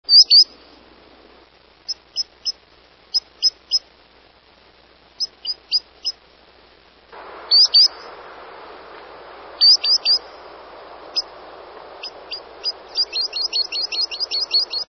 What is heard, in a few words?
Solitary Sandpiper